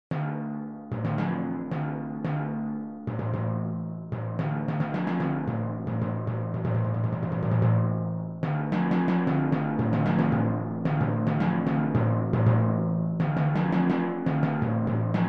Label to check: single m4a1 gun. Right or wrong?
Wrong